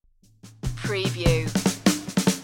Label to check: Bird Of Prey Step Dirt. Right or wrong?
Wrong